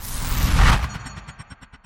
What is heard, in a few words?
portal close, portalgun; portalmod gun; weapons